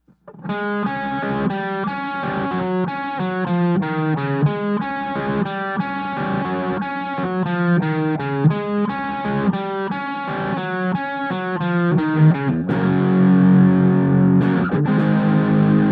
Electric Guitar Tone

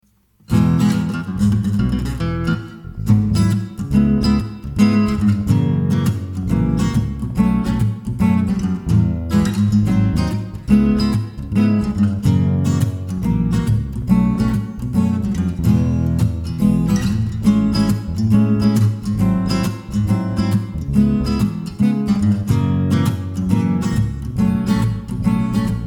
backing Track

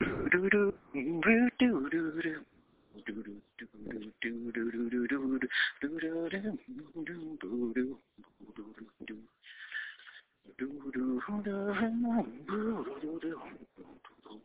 happy ish vox melody fragments

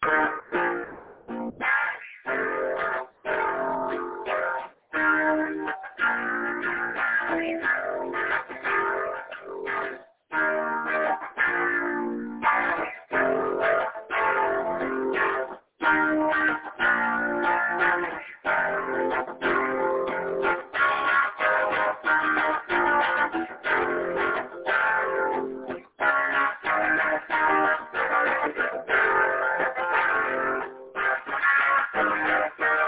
epic arena pick it up chords

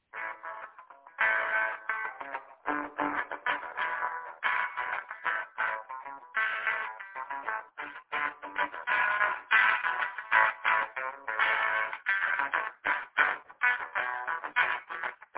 a major rock

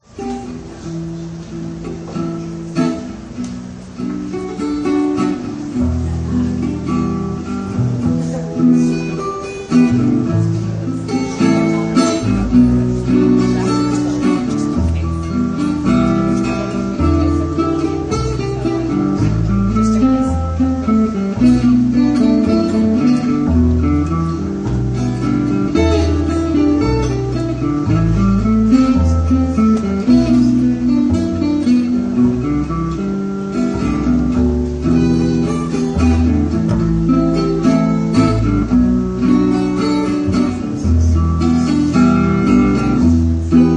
instrument Change Interlude

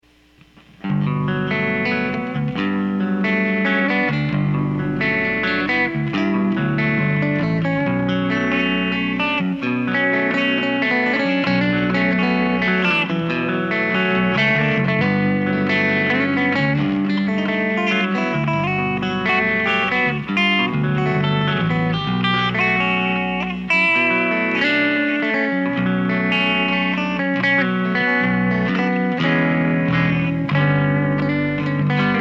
noodling idea

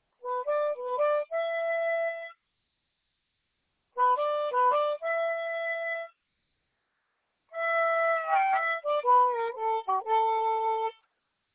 simple minor blues

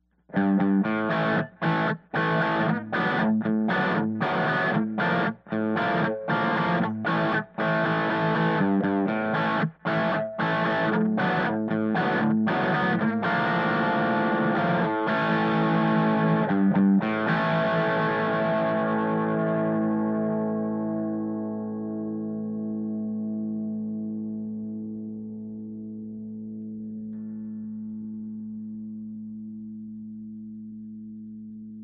amp four feet from wall

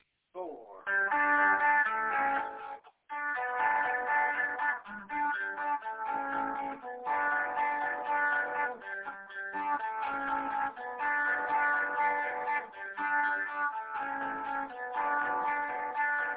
ostinato

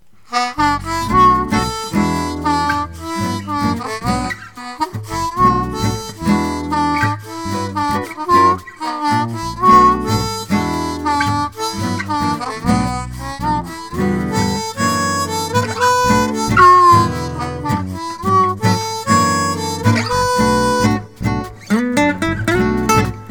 rough Tracking Mix

